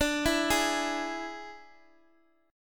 D Suspended 2nd Flat 5th D

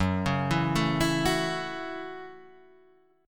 A 6th, F sharp, chord

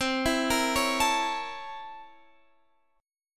C 13th Flat 9th C

